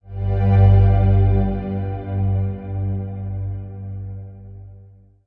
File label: sting box start close